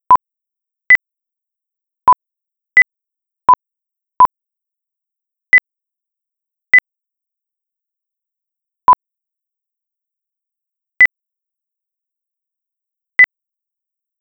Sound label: Auditory Oddball Paradigm